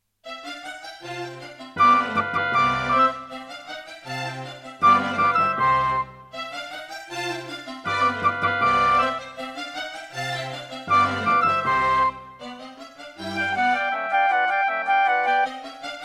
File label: Boss Dr. Synth DS-330; classical; Mozart; Concerto K467 partial